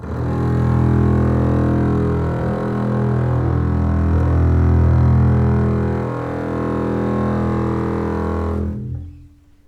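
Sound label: E1 legato, strings; East West; The Ultimate String Collection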